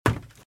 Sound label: Footsteps - Wood - Creaky - Run - Left; RadwinIsland